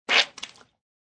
Spitting Liquid; Spitting (Sound Effects:Human)

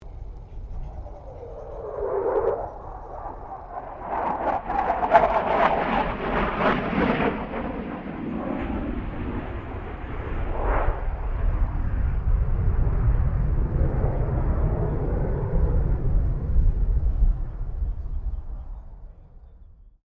2005 F16 FALCON PASS SLOW; Airplane; Vehicles; Aircraft

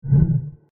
Weird And Low Organic Whoosh; Andrew Fleming

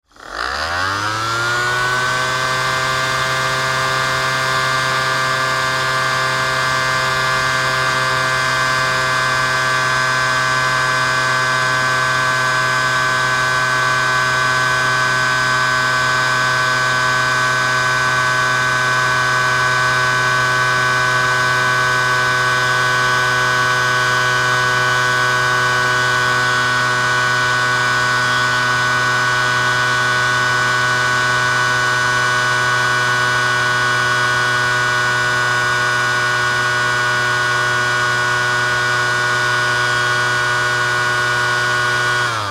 Air Pump Electric On Run Off; Air Pump Electric Motor On Run Off; Air Pumps (Sound Effects:Machi; Blastwave

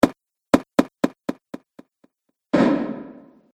Impacts Dry Delay Reverb; Hit Sound Effects

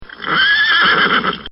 Pony Whinny; recorded in a custom built sound booth

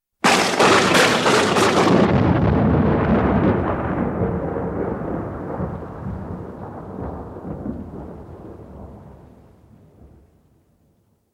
Thunder crash; Thunder (Sound Effects:Weather); BBC

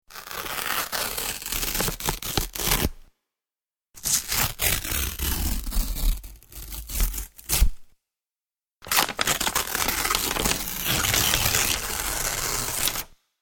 Various cardboard tears.; Materials; Cardboard Rips

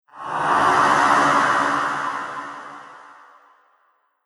Sci-fi Monster Laser Lizard, alien; Science Fiction Sound Effect

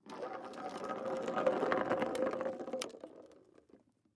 Can Roll Left To Right; foley; Rolling Sound Effects; Objects; Blastwave

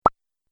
Idea Single Pop, cartoon

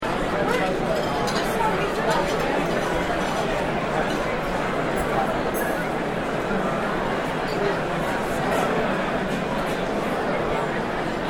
Restaurant Crowd Evening Party Sophisticated; Many people dining in a fine restaurant, function, fundrai; Crowd Sound Effects

